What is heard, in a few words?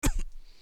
hit; Assemblee SFX